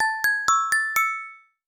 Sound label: Crystal, RingTone